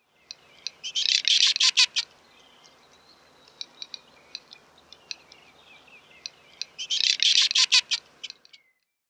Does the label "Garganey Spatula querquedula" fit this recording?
Yes